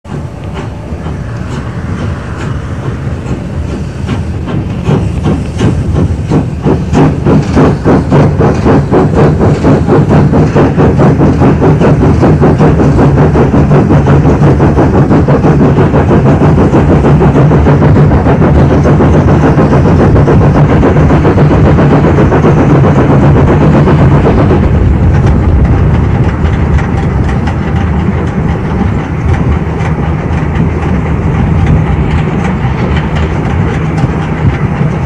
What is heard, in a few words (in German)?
Abfahrt, eisenbahn; SteeleSued